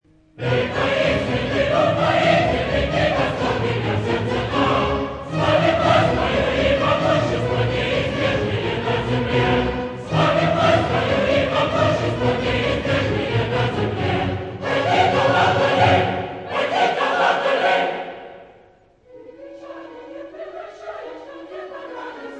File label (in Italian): coro; glinka; ruslan e ludmilla